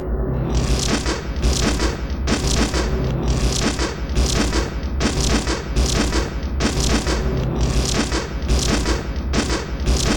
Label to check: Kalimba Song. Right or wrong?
Wrong